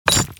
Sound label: Magic Item